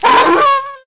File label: dingo die; koala seasons; raylib